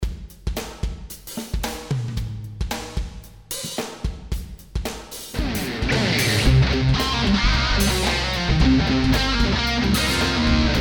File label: BLUES IMPROV